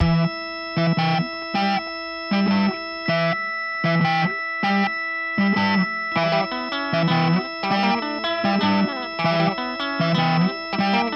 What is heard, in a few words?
sound track lazer